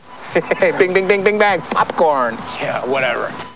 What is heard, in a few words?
popcorn